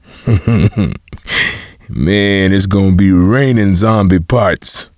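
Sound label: Take grenade launcher; L4d2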